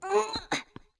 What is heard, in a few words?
Link Ledge Climb; The Wind Waker; Link climbing up a ledge